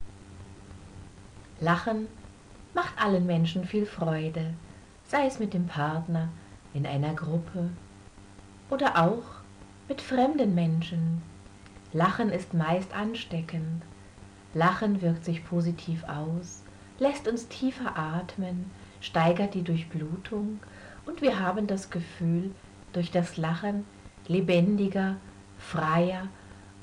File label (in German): Lach Hihihi